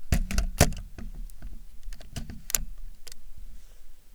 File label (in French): INSERTION DOS MAMIYA RZ67